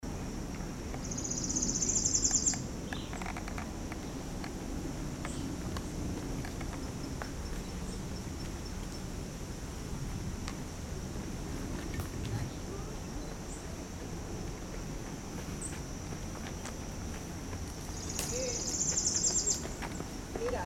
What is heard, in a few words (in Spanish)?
pitiayumí